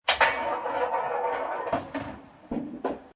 Billiard Pool Snooker Ball Delivery Mechanism In Table; Pool table, Snooker Table, Billiards Table: Ball delivery mechanism inside table 05 with ball rolling on rail; Pool Sound Effects